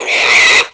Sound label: Pig Squeal